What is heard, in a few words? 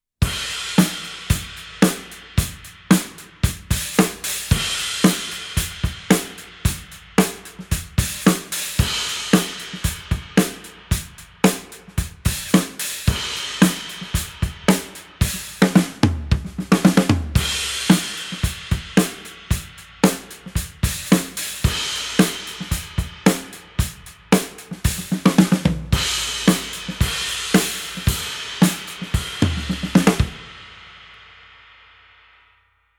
TRX Thunder Lightning crashes